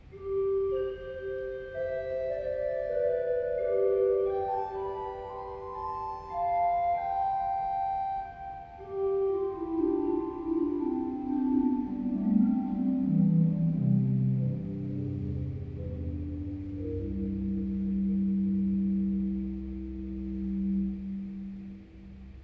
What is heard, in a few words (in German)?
lieblich gedackt 8, Positiv, Lieblich Gedackt 8'; kirchenmusik; pfeifen; gereon koeln